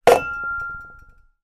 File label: emergency fire extinguisher put down on hard floor